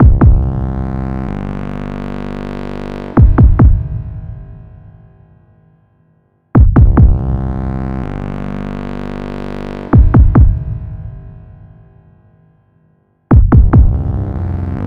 Helicopter Crash; Bionic Commando Rearmed

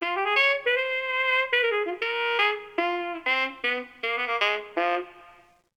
Billybass Jingle; lego the incredibles 2018 pc

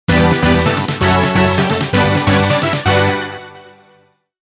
Win Jingle Theme

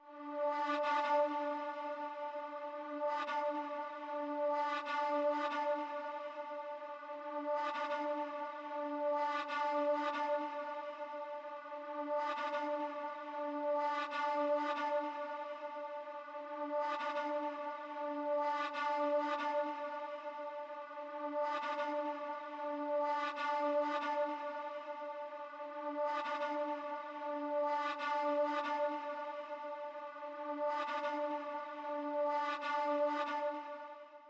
Dark flute; Jungle; Far Cry

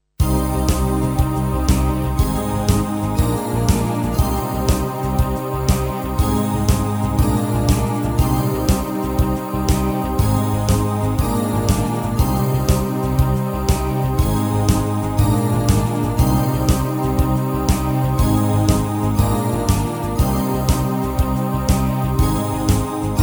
Synth

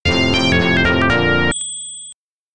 Break Music; Ski Kid Dx Version; Sky Kid

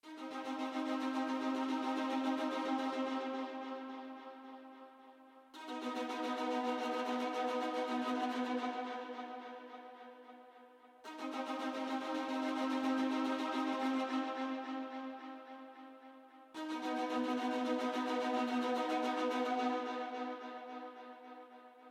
Loading up Strings; Sniper Ghost Warrior 3 2017

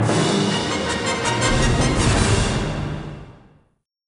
Level Over Win; Star Wars Episode I Jedi Power Battles 2000 Dreamcast gamerip

